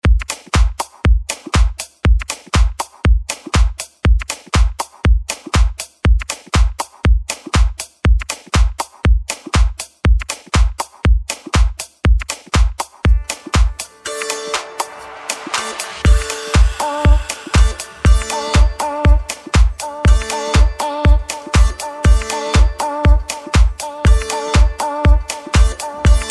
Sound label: Sitar Song; Remix